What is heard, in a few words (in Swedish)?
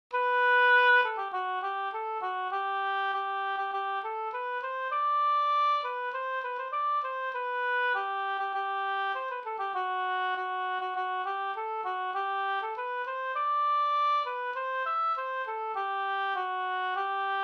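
brudmarsch Karlebynejdens brudmarsch Finland G dur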